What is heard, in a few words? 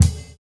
Kick, jungle breaks drum sounds